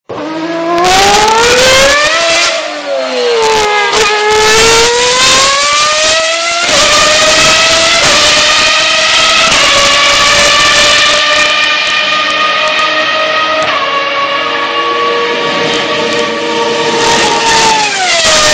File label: Formula 1